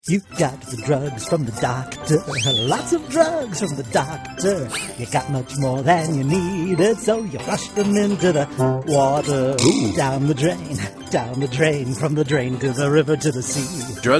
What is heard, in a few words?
jingle